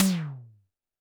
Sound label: TD-20 Tom B, Roland TD-20 Toms